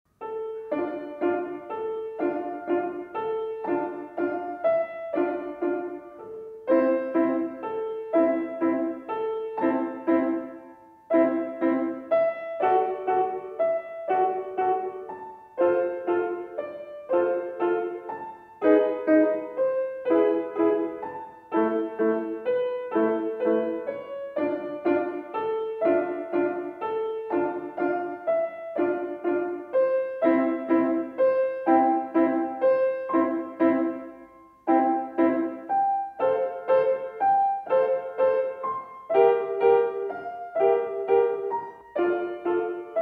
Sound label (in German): Lyrischer Walzer; Dmitri Kabalewski